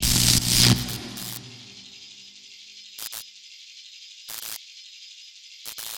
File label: Lantern Lightning; Ninjago